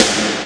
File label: snare; CLARITY